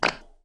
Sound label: pooping; horror